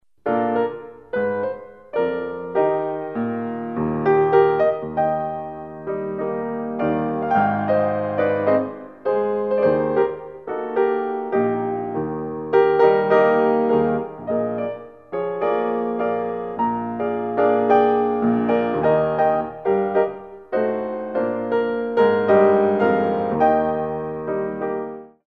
tendu 4/4; gottschalk; Music for Ballet Class

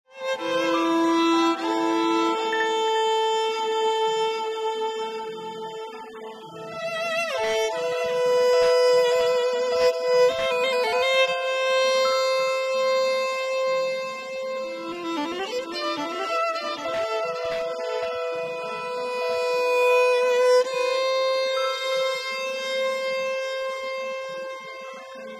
piano

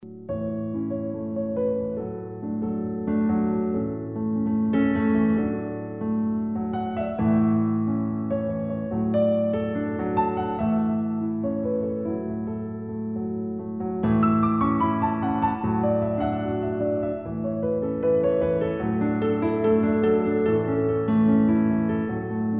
piano

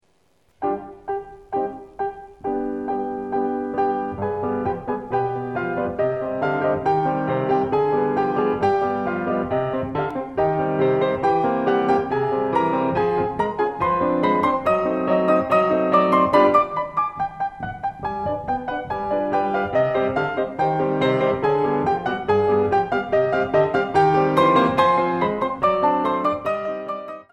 coda femme; dancearts

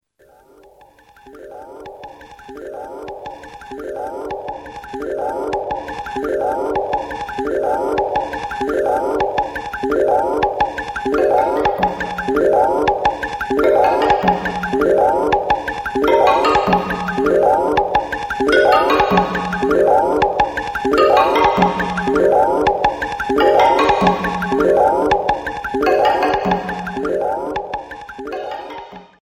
jazz; Modern Jazz

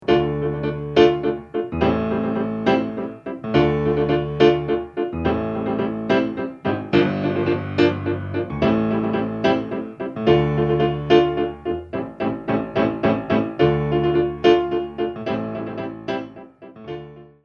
grands battement

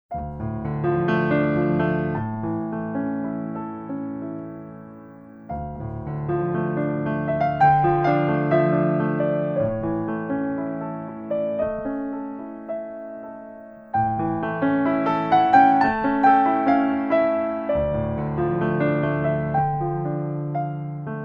adagio; tendu

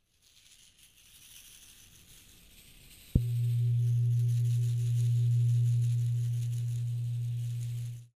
gong

